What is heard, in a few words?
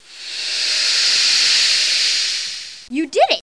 puz Compl